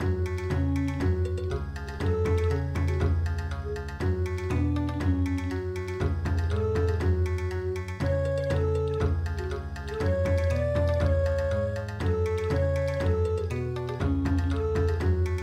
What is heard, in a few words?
Bon Odori; traditional